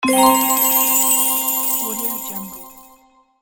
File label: mystery chest prize